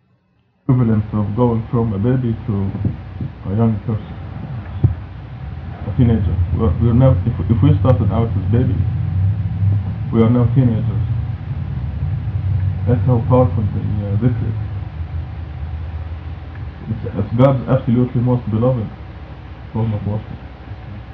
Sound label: Night of Destiny Zikr